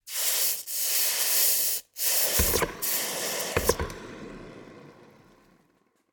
smoldering metal in water bucket; sonniss gdc

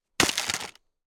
snow details ski drop; fvtt sonniss gdc audio

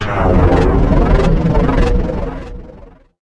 Displacer Hit

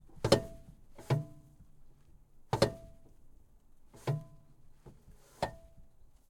skoda fabia r5 gas pedal; Sonniss GDC

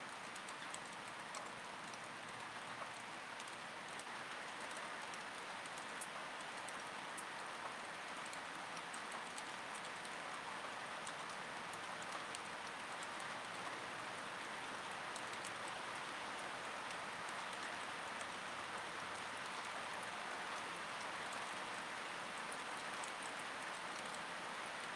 ambient rain light washed out stereo; fvtt sonniss gdc audio

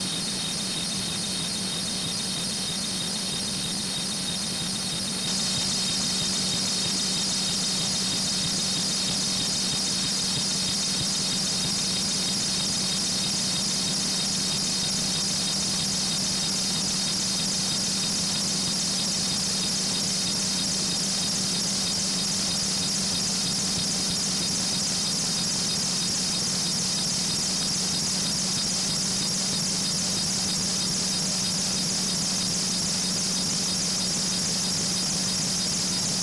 harsh noise feedback loop; sonniss gdc

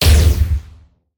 CPR Fire

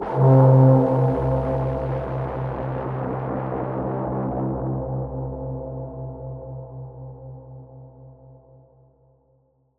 braam dystopian c, intense trailer elements; Sonniss GDC

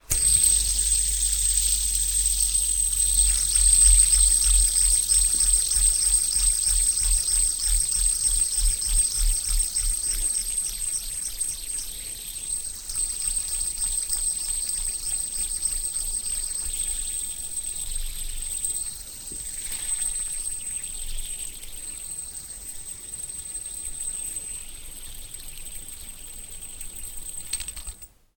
hand spinner; sonniss gdc

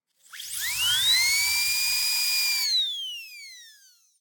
odontology; dacave

